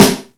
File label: raw acoustic snare f sharp key